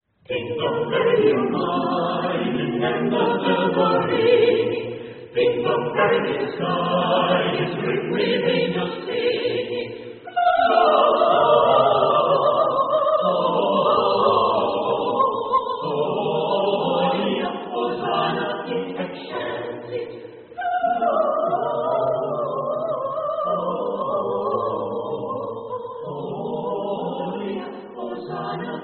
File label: Ding Dong Merrily On High; 圣诞节Christmas